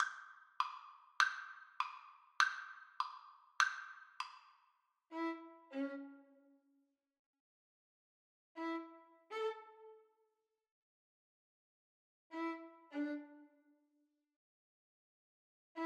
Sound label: violin duet